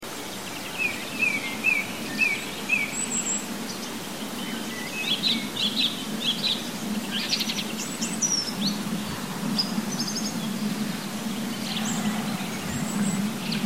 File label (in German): voegel